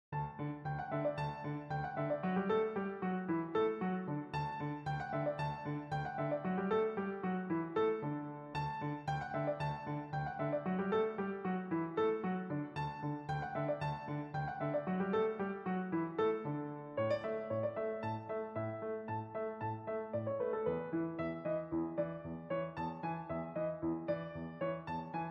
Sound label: musette